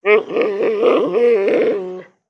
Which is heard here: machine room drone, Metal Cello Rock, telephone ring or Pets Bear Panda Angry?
Pets Bear Panda Angry